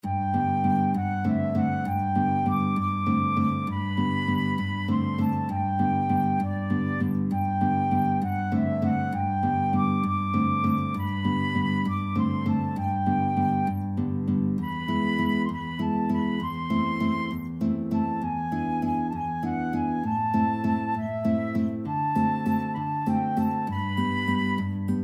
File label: guitar flute duet; mauro giuliani op74 3